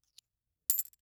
Foley Coins Single Coins Cling While Counting

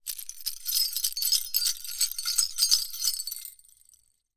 Bell Tiny Bells Rattle; Foley

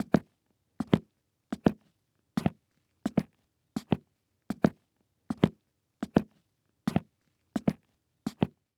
Foley Footstep Golf Cleats Walking On Cement; Foley Footsteps

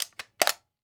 Weapon Gun Machine Gun 9mm Clip Insertion; AdobeCC

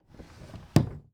Drawer Close; household

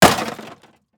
Impact Plastic Hit Cement; Impacts

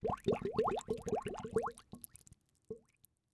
Liquid Water Bubbling From Submerged Bottle

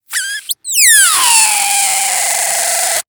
Cartoon Balloon Release Air Squeal

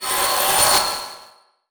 Title Transition Grinding Element; Production Element

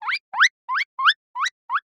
Animal Mammal Herbivore Guinea Pig Squeak; animals; AdobeCC

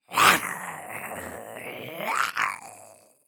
Horror Scary Human Animal Growl

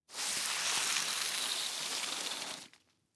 Household Broom Sweep Leaves On Cement Slow

